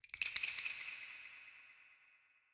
Freeze, Guns

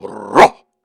Grunts and Chants, Viking Vocal

